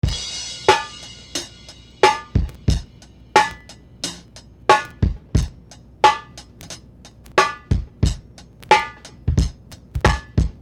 Beat

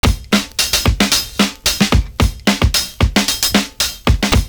BEAT, loop; Univers Sons - Kitch 70s

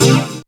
synth c, Dance Synths, Stabs & Hooks; Best Service ProSamples vol.37

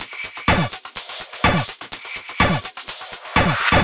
125 No Bd, Drum Loops 125bpm; Beatdown 1984; Nu Dance Electro

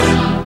SYN, dance synth; Sound & Vision - Gigapack I CD 2 (Roland)

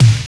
kicks

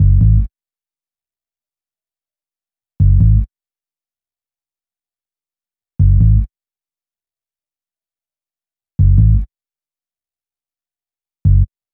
Bass, Hip-Hop

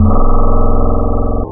PPG, Classic Synths wavetables

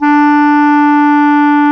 WND CLAR2, Clar Jazz

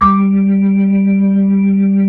B-3 C3, Keys of the 60s and 70s; Roland LCDP10 Keys of the 60s and 70s 2; Blues

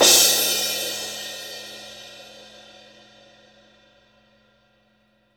crash; East Collexion; Drum 1 Dry

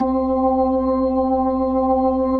B-3 Jazz; Keyboards of The 60's and 70's

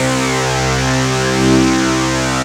Stab

